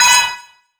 win symbol Flash; netent

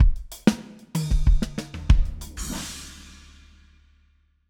music main game start

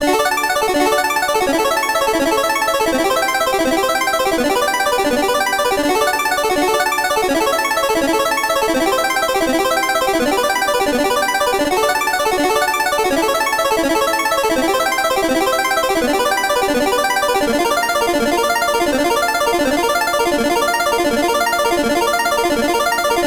main game win loop; netent

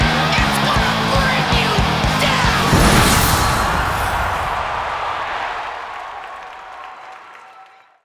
Big Win End; netent

